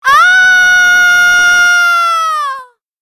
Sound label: Aaaaaaaaaaaaaa